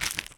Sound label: step; Monster girl quest Part1,2,3; Visual Novels